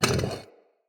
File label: Vase Medium Slide; Vases